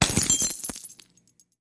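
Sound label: 1 Drinking Glass Being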